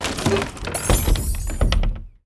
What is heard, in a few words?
chest open large latch hit crate box; kids game; fantasy object loot